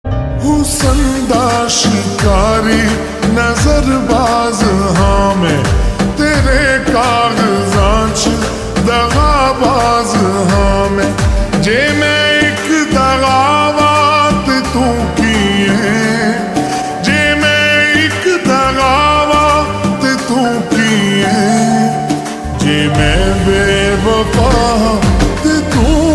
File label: Slow Reverb Version Ringtone